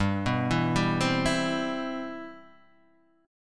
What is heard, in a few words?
G 6th, strummed; G611 Chord {3 2 2 0 1 0} arpeggiated